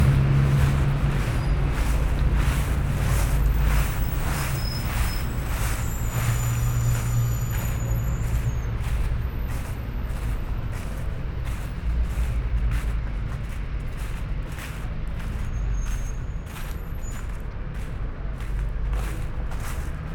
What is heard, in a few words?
Walk, London; Walkman Performance